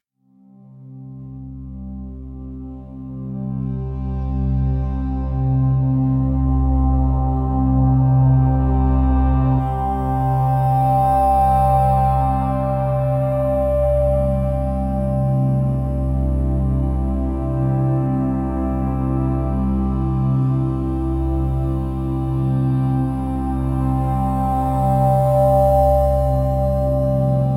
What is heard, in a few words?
pure ambient; for sleep complete silence